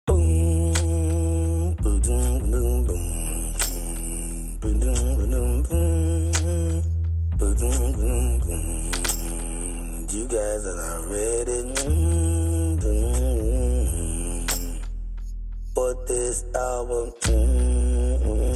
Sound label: Interlude